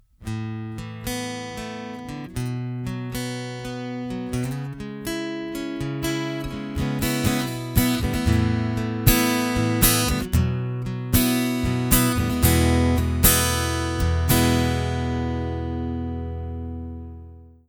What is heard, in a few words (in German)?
gitarre bodyrez neutral